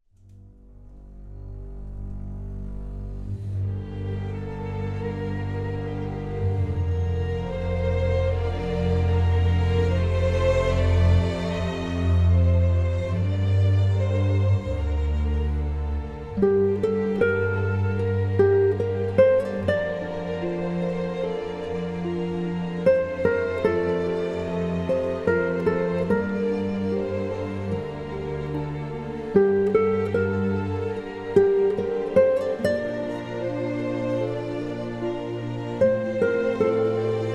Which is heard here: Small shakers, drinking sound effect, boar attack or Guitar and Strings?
Guitar and Strings